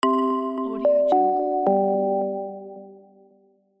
soft and enticing logo

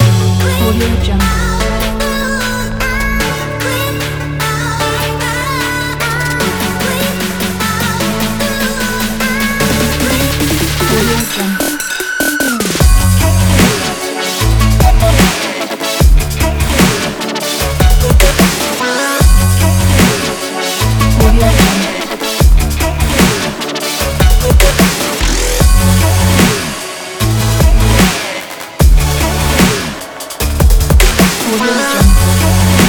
energetic sport action pack

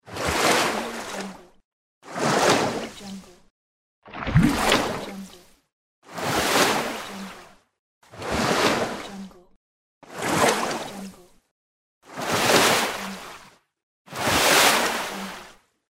waves; tibasound

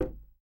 chair hits wood

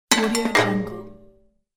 percussive score notification

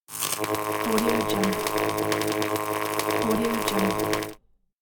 electricity spark

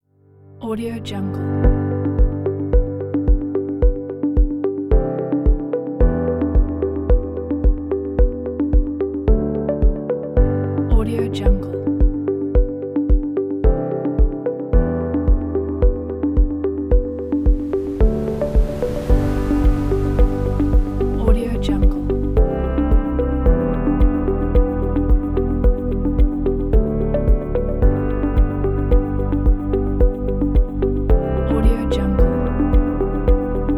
ambient music background; artmusician

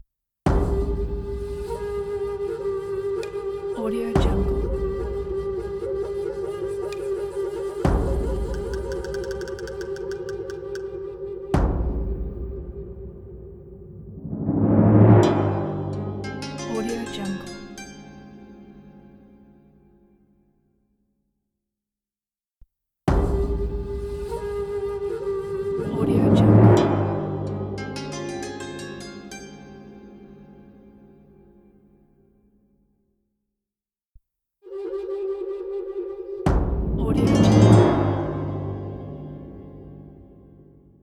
japanese logo

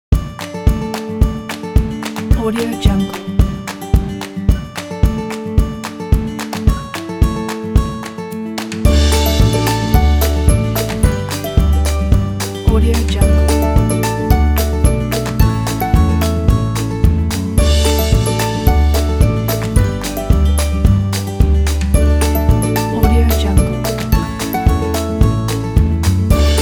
uplifting indie folk

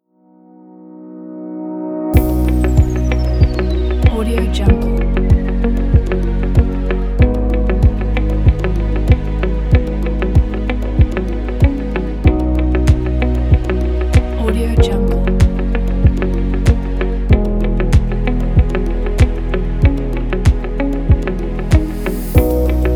tech ambient

